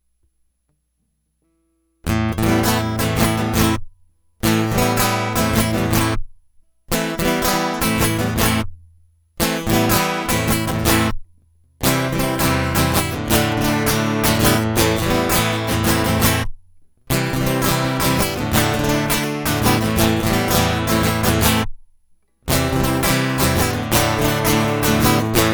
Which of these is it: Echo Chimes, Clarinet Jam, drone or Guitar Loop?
Guitar Loop